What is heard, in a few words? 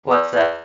Whatsapp Robot, ringetone